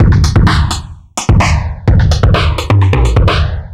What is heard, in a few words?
Old Drums 128; musicradar